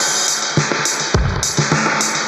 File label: Beat FX A 105 bpm, Beats; musicradar; dub designer